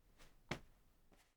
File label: body pat muted; prosoundfx